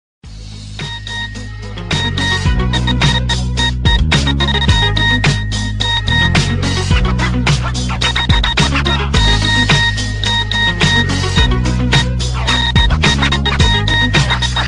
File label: you have a message ringtone